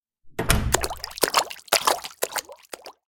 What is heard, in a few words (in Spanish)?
puerta agua